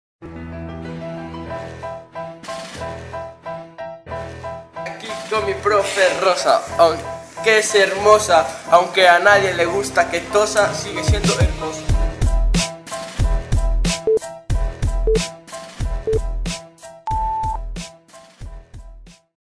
rap

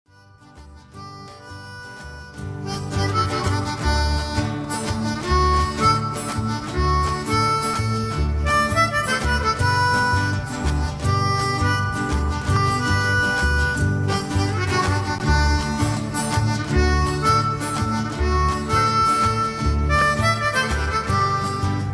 la cucaracha armonica

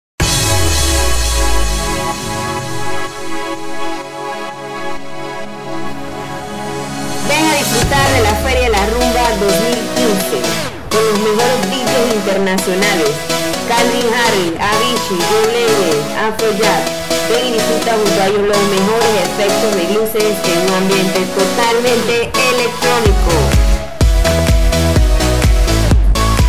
jingle feria

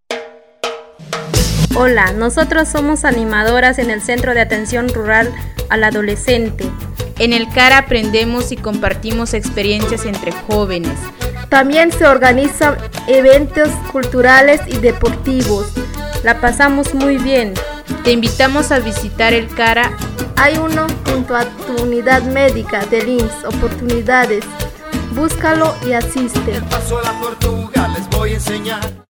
animadoras music

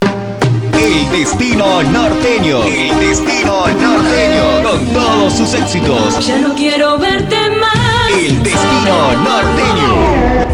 grupo musical norteño

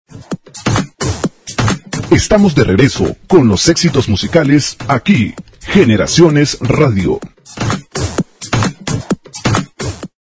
pausa comercial salida

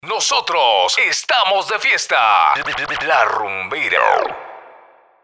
estamos de fiesta acapella

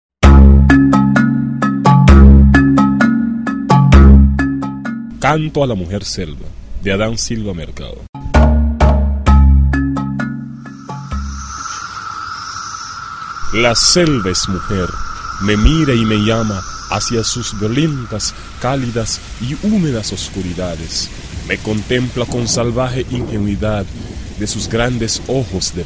canto a la mujer

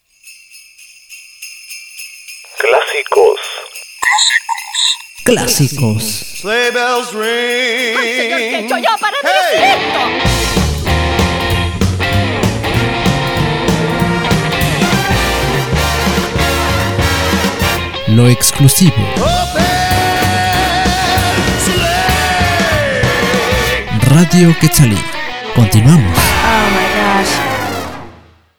jingle navideño rockero jazz; quetzali radio

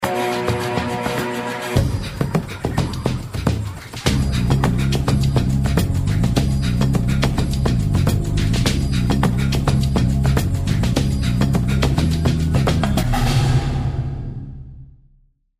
lindo tema instrumental de un documental sobre naturaleza

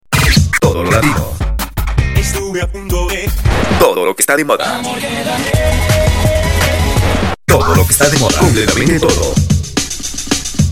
todo lo latino club

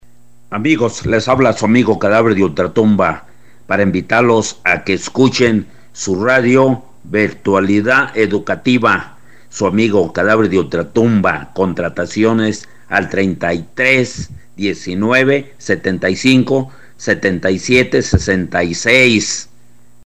jingle; cadaver de ultratumba

